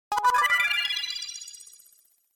Magic up

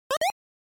NES Action Stomp

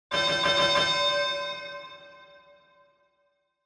Boxing Bell

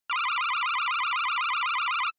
Racing Tire Squeal

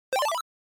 NES Action Item

